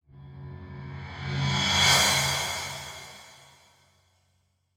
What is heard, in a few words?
Reverse Cymbal